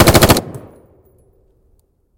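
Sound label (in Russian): пулеметная стрельба по врагам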